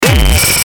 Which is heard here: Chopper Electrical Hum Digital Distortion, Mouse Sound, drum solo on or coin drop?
Chopper Electrical Hum Digital Distortion